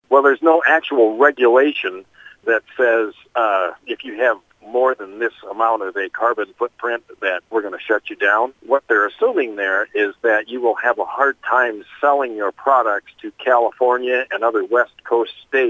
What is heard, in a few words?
Pipes